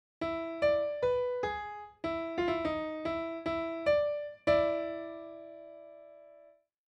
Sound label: Tango